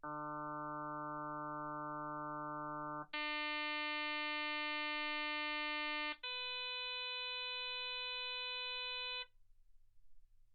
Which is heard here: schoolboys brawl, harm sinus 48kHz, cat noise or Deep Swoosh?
harm sinus 48kHz